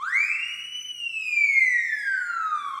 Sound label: big Win Police 2 Oct Up; hotline2